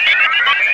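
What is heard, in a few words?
sphere blips large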